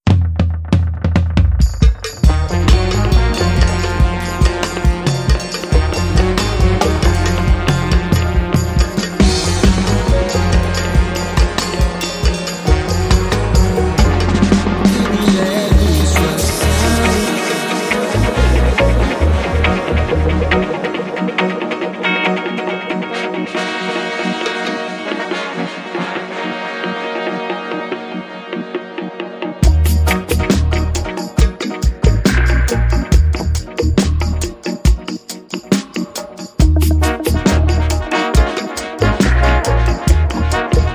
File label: Roots Rock Sound Dub